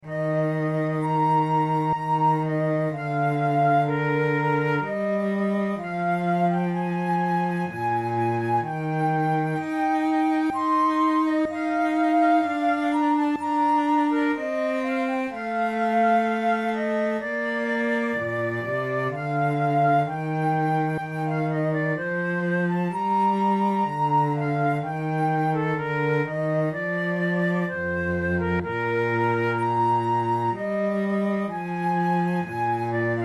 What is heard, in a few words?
adagio, flute